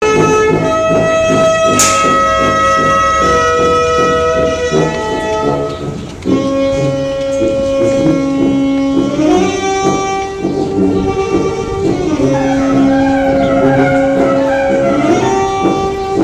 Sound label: tuba